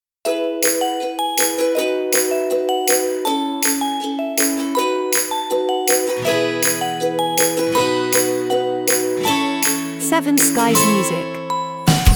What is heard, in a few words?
instrumental